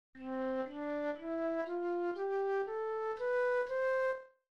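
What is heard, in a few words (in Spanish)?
escala do mayor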